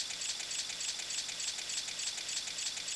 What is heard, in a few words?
rifle idle; cstrike; weapons; sprifle